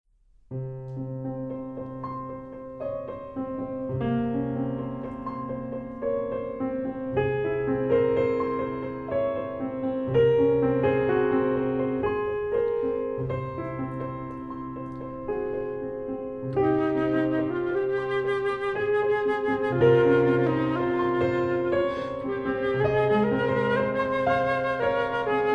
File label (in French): Esquisse flute piano